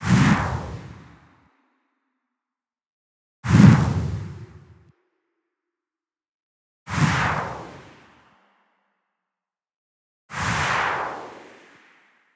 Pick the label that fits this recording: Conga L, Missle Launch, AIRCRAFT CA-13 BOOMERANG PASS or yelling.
Missle Launch